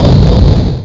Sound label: stair; FinalOdyssey